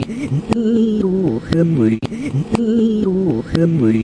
backwards alien yodel; VOCAL OR VOXY LOOPS

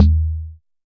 Jomox MBrane drum machine; Jomox MBrane 11

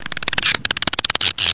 Adding Machine

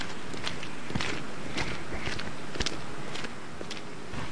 more walking, looping ambience

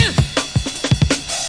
161 bpm grunt break, drum loops and breaks

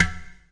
clay pot hit

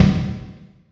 ambient kick; Kicks; single hits

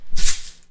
rainstick shaker

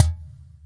verby cajon lo

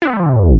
gliss down bass; Basses

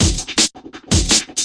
straight break, 165 bpm; 161 to 180 bpm

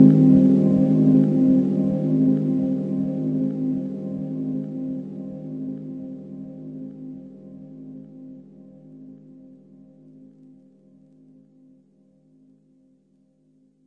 muted seventh, Pads

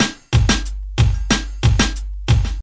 straight backwards, drum loops and breaks

